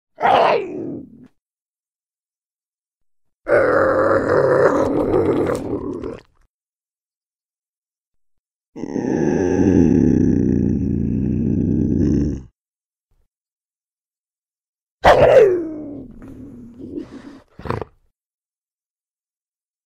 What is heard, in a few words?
Bobcat Sounds